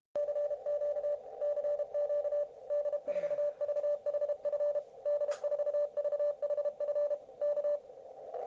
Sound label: 6m CW